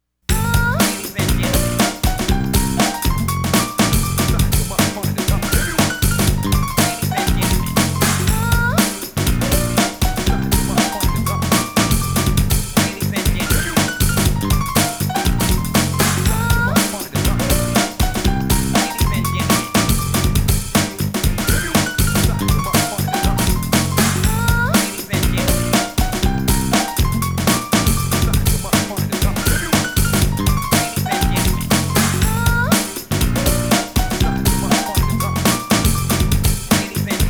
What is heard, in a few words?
BEAT LOOP BOMB (Select BGM); GTI Club; Jet Wave; Winding Heat